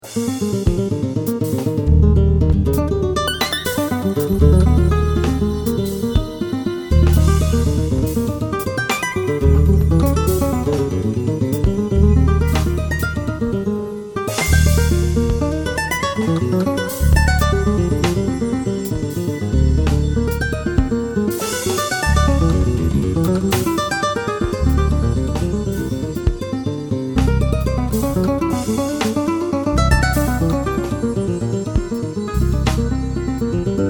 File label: jazz